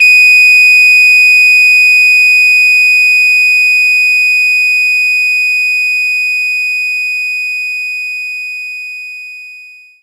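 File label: French Horn D sharp 6; Knights Try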